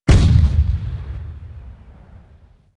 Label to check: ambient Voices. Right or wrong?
Wrong